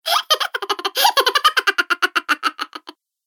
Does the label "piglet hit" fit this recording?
No